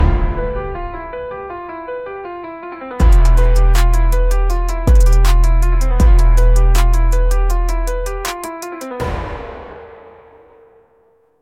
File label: Logo Piano Loud